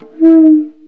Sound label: feedback; Wired for Sound Pro CD; Aristosoft, Inc.